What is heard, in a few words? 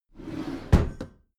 packed drawer smooth close regular halfway